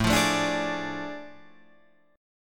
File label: A Major 7th Suspended 4th Sharp 5th, Guitar, Drop D